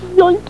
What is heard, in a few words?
Yoink